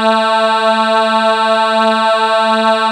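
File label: VOX A2, CHOIR; TOMVOX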